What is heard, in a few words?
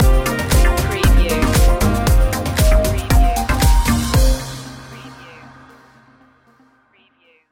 sunshine waves stinger